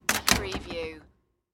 Door Close Rattle

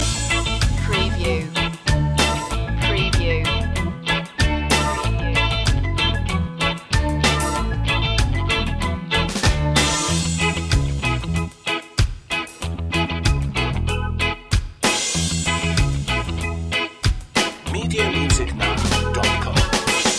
rock steady loop

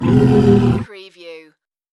creature vocal

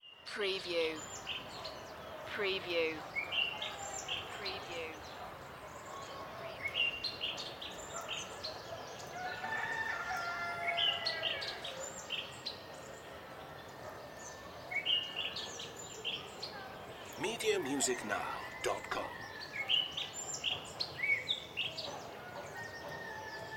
Petting Zoo Birds

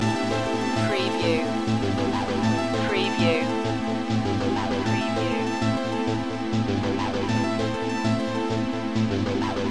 impulse loop

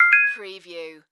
Multimedia Alert

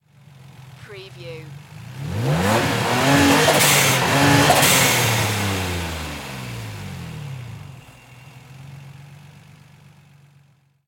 NISSAN 350Z ENGINE TRIPLE REV

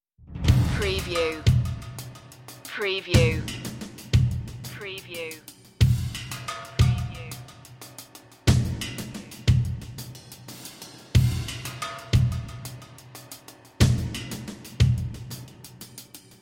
percussion

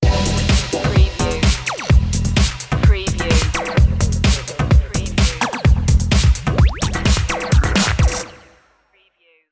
disco stu sting

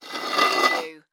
Can Tin Empty Slide

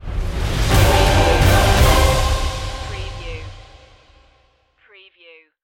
bad moon stinger

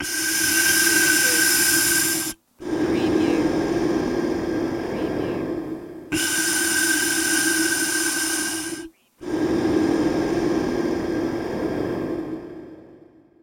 Breathing in mask

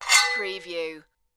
Swords Sing

